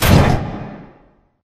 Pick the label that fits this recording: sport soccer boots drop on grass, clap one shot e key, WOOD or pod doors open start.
pod doors open start